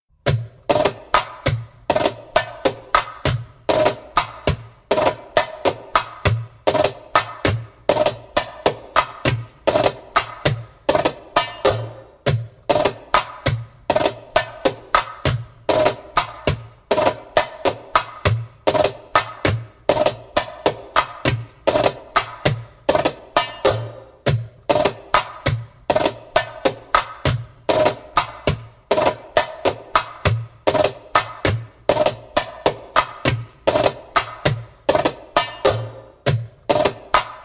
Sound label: Clear 'n Crisp (slow), Djembolale - Fills and Drills